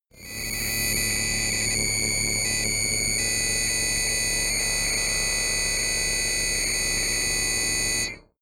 glitch scanner

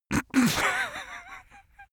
male laugh snort

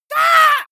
monster painful cry high

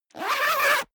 zipper down jacket close fast

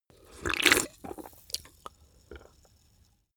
drink soda can small slurp

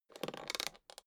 suitcase open latch lock slow

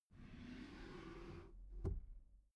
desk drawer open soft

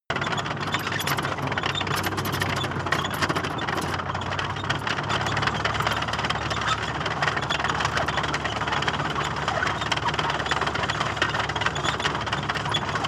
tank large tracks rattle fast

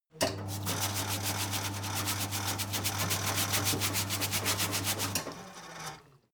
mechanic sink running short brush metal

